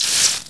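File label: steam burst, airgas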